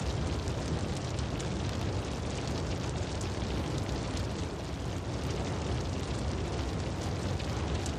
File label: fire loop; Wazdan